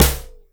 Yamaha DX7 Snare Drum

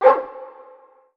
Kawai K5000W Dog Bark

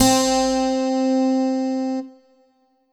Yamaha EX5 Harpsi Gtr C4

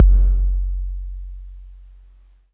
Kawai K11 Kick

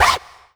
Roland SC-88 Scratch Pull

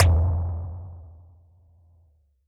Kettle Drum

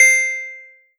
Roland JX-03 Celesta C5

